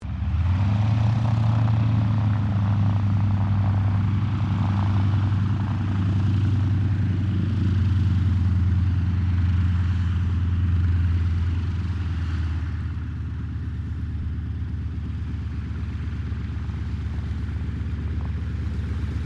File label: AIRCRAFT YAKOVLEV YAK-52 TAXI MEDIUM, Russian Yak 52 Training aircraft; Airplanes